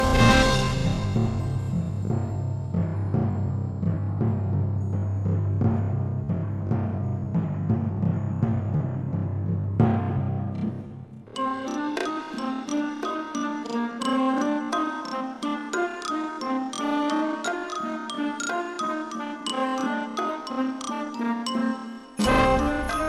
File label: super slow; bars 10 to 23